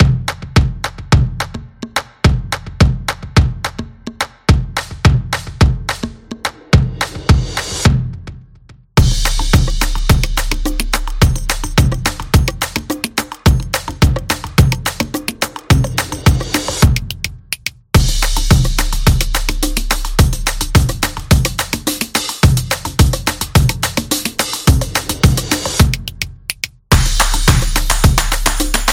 Percussion Party; percussive nation vol 3

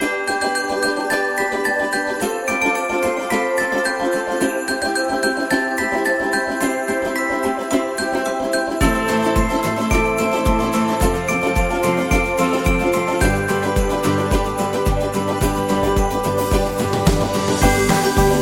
Christmas Upbeat; xmas

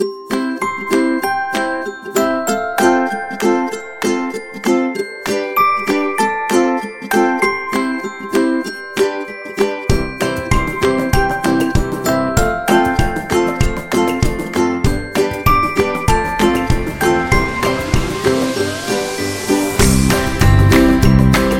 Summer Ukulele, happy music

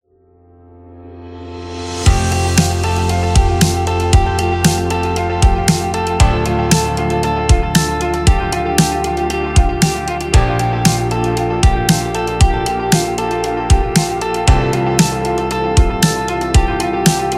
Rock Indie

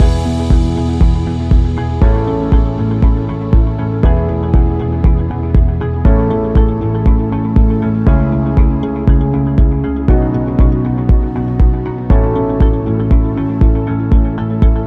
Corporate Soft Loop

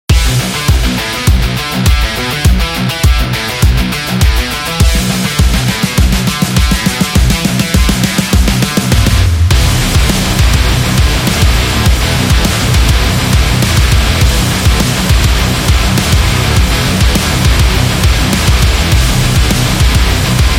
Sports Rock

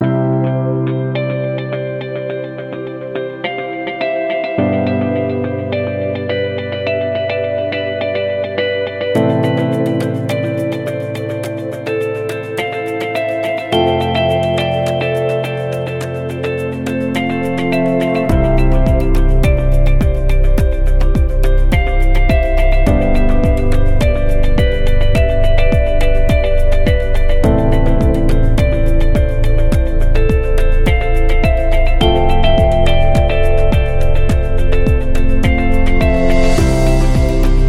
Soft Ambient, corporate music; corporate music vol 14

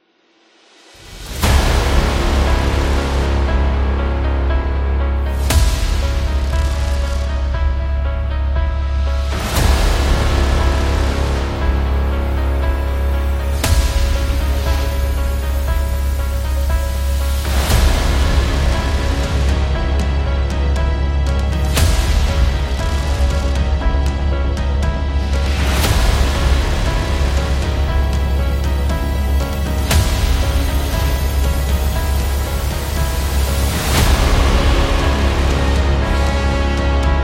Natural Disaster

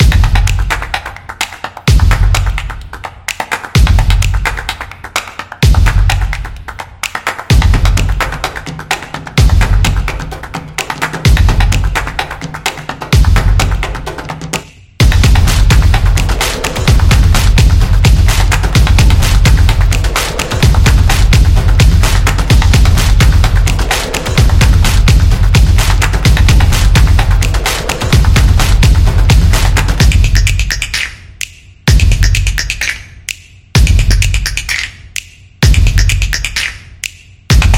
Dynamic Energy

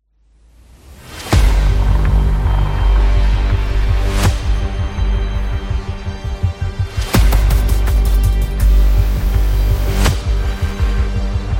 Action Aggressive Trailer

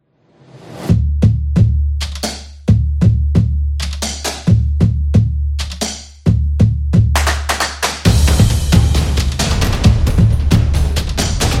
Percussion Upbeat

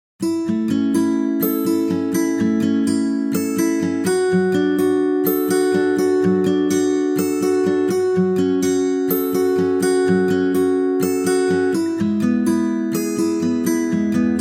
Guitar Commercial; happy music vol 6

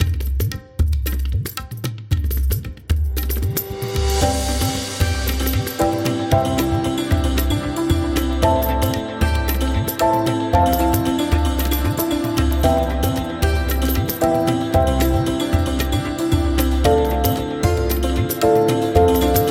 India Positive

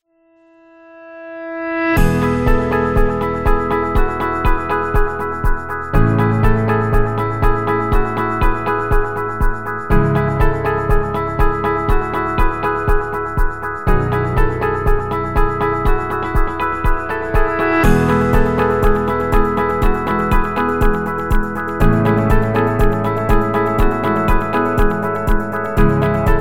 Soft Minimal, corporate music; corporate music vol 5

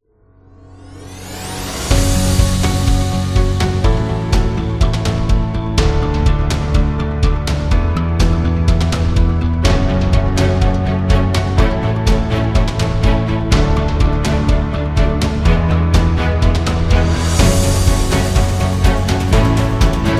Motivated; epic music vol 3

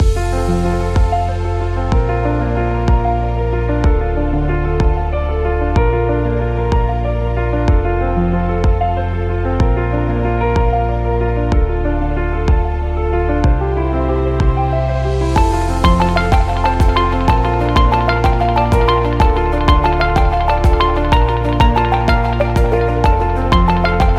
Real Estate Loop Presentation

